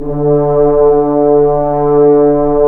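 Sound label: brass french horns, Roland; Roland - LA Composer vol. 1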